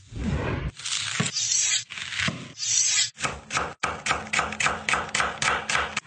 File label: Chopping vegetables